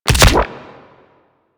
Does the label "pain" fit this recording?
No